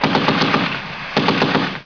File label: 50cal